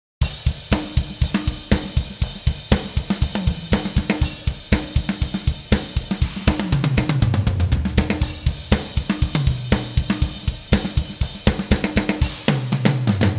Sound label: metal machine dry; ezx